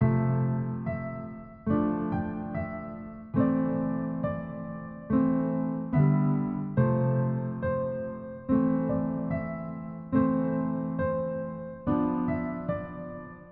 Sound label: Piano movie trailer 71